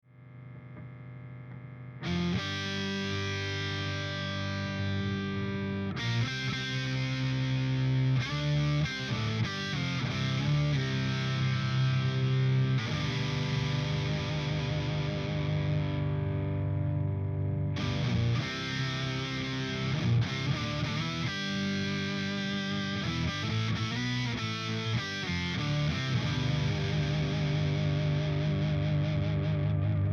tc electronic sub n up octaver gitarre verzerrt classic

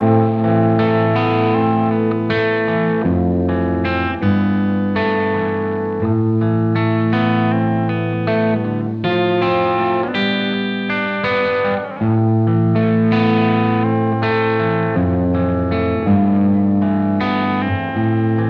gitarre mit; virsyn reflect

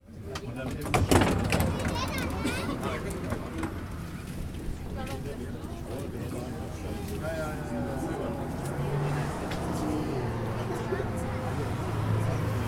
Straßenbahn; tascam dr-22wl

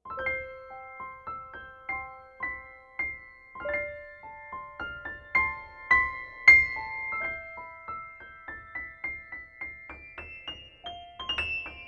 casio gp 310 klang

